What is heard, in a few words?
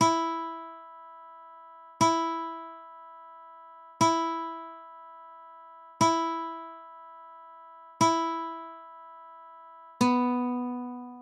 guitar tuner